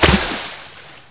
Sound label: water in; hurrican